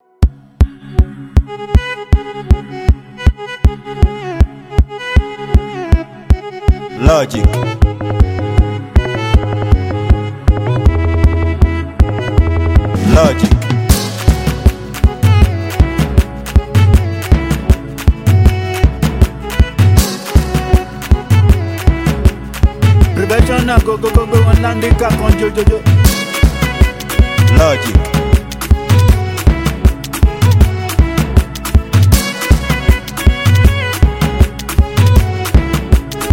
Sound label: Professional Beat; Nigerian Producer; A-talented Nigerian Producer Popularly known as "Professional Beat"